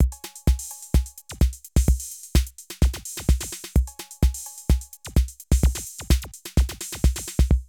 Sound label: Drum loops, Euro techno; Zero-G Groove Construction (1993)